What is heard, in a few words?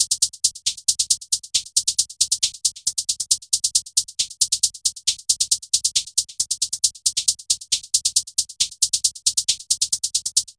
Maraccas